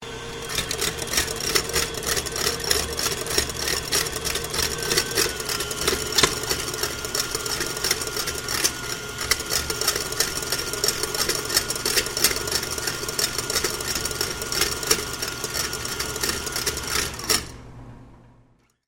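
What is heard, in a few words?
blender and juicer sounds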